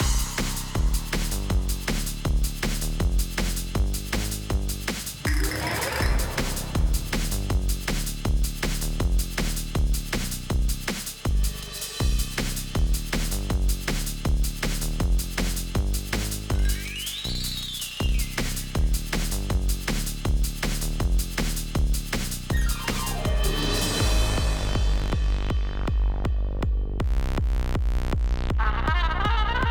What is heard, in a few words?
Nightmare Chill